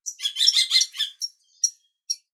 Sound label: birds sounds